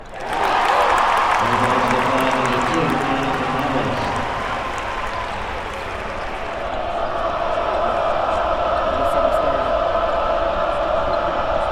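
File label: Arena Shout Noise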